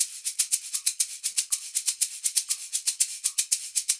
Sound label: SHAK, Percussion Loops